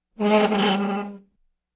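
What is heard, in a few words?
yashchik otkryvaetsya so skripom, drawer opens with a creak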